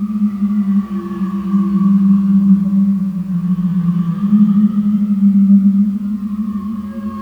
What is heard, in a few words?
ambience